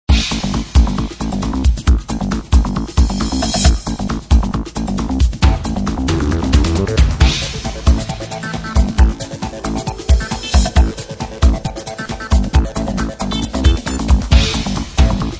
音乐铃声

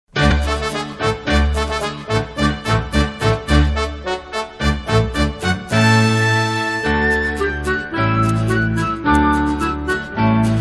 音乐铃声

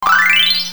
清脆

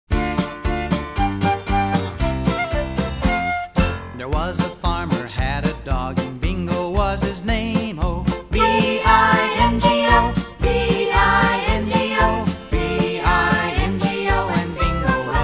bingo 英语儿歌; 英文儿歌

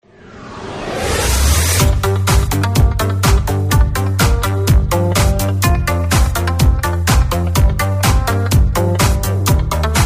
伴奏

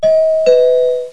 门铃

